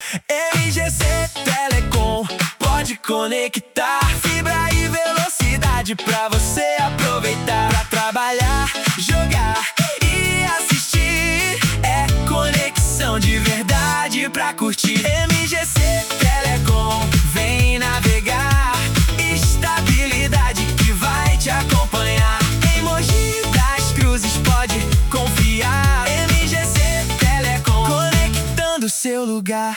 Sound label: Jingle